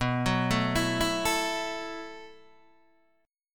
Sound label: B 7th Suspended 4th B down Guitar DADGAD